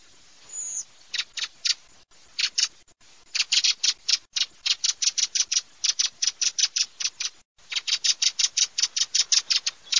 SASSELLO SCHIAMAZZO

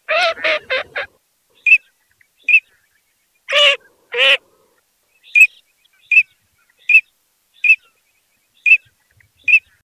alzavola

